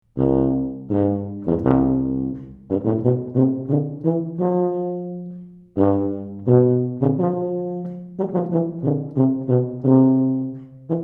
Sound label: Tuba High School